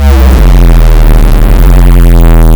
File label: farti; OSWorkshop